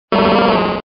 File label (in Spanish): Grito; Marowak